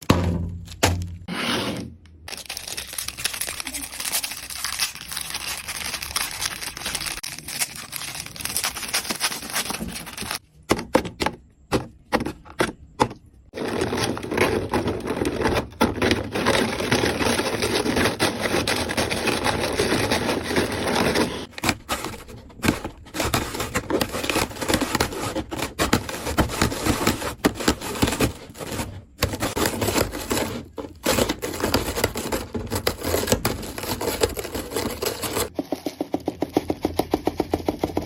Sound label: biscuit asmr, خرد شدن بیسکویت